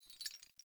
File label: Movement Chains; Characters; Enemy; Foley; Sinecure; Melee Grunt